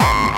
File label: BD Distortion, Bassdrums; Vengeance Samples